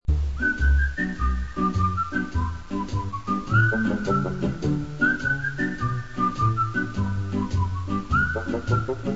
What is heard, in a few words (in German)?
pfeifen